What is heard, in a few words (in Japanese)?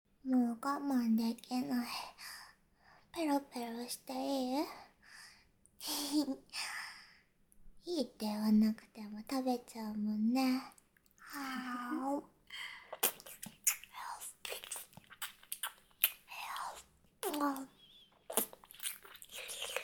フェラ